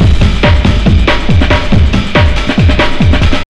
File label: Big Beat Drumloops, SPEED 140; USB Soundscan vol.25; AKAI